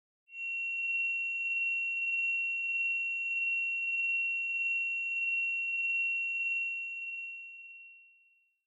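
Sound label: sounds of endings outro, disappearance fading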